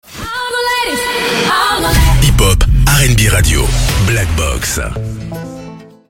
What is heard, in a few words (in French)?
Habillage TV Radio Jingle FR; Blackbox FM